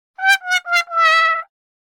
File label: trombone